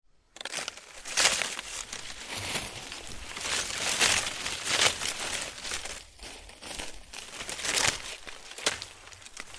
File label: Foliage movement garden brush leaves